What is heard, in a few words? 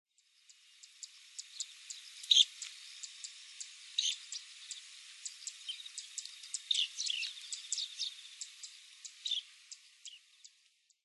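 Dunlin, hyogo; koe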